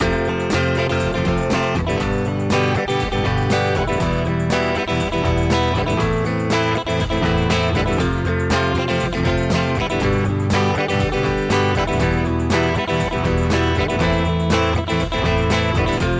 middle Electro Ac

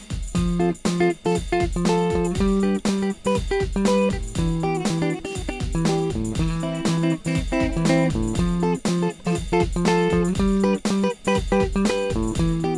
three finger

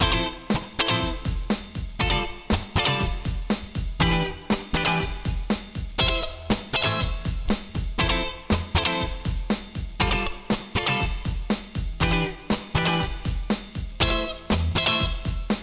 cutting acoustic

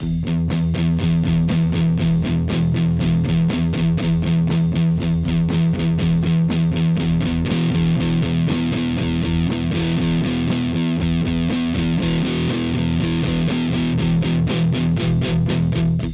El R powerchord HEAVYSTACK

